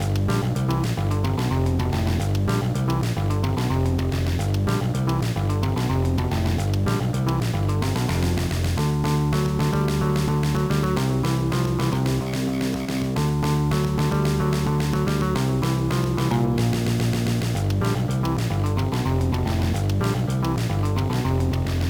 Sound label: BGM; shrek reekin havoc; gba